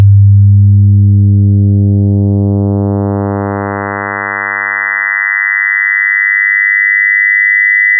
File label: gbuzz